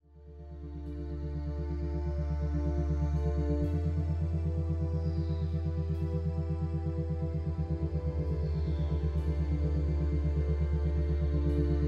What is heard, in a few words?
Exhale Mind Body